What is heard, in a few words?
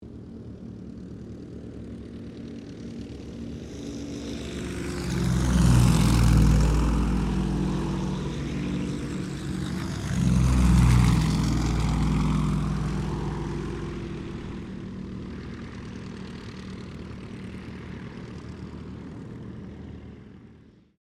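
AIRCRAFT YAKOVLEV YAK-52 SQUADRON TAKEOFF, Russian Training aircraft; Airplane Sound Effects; Vehicles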